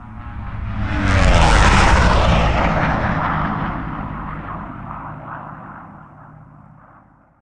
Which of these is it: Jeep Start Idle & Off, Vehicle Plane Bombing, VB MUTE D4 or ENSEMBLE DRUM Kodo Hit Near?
Vehicle Plane Bombing